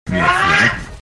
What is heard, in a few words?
Noise